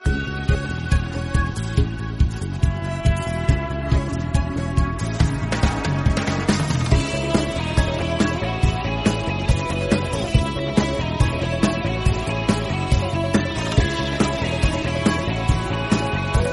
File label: Rock Ringtone